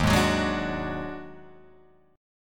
Eb Minor Major 7th Double Flat 5th, Guitar, Open G